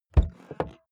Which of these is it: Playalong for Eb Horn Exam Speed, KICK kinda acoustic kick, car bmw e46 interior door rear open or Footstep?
car bmw e46 interior door rear open